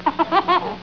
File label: Baby laugh; penny